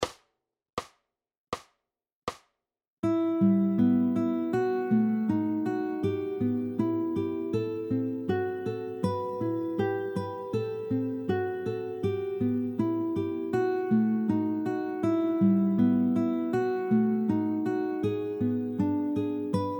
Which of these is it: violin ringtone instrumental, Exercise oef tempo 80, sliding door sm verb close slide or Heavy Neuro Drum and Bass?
Exercise oef tempo 80